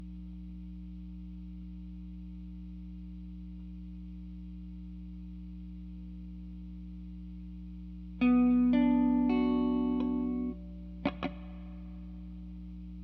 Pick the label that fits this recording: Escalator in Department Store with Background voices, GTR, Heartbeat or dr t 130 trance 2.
GTR